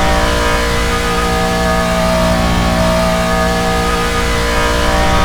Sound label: INT high, Vehicle; NASCAR Thunder 2004